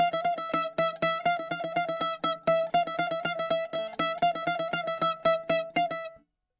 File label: bouzouki